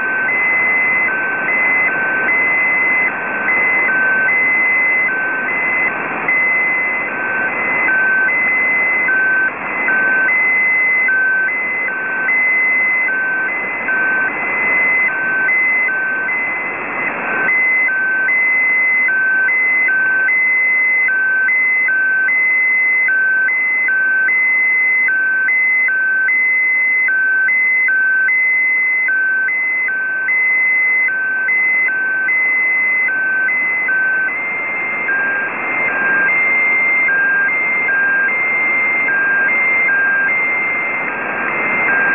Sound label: 10255 khz